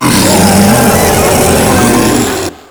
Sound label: Taunt Scream, Proto Infested Ancient; Warframe; Abilities